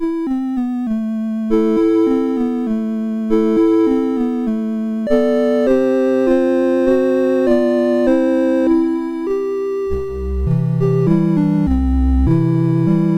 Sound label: Piano Concerto, MOS6581R4